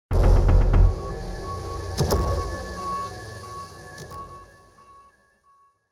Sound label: action